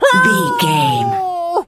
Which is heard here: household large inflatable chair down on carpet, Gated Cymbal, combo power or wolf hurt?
wolf hurt